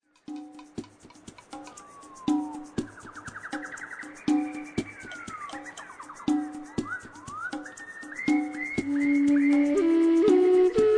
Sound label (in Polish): muzyka bez oplat